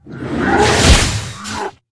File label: orc attack, monster